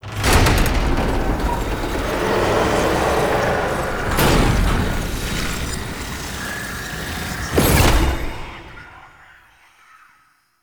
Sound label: Extraction Cinematic SFX; Warframe; Entrati Labs